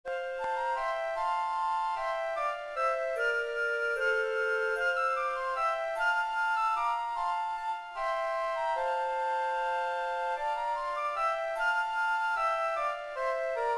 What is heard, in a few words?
Flute; Hymn